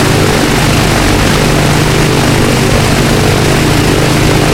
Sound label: blenheim side, engines; War Thunder